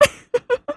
ufufufu

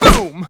stab

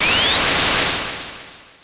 spindash; sonic

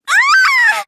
scream; tenko

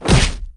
punched; jay

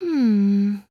"hmmmm"; koizumi

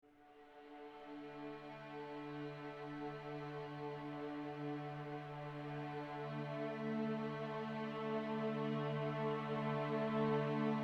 ambiance; starbound

